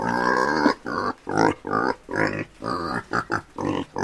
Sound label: pig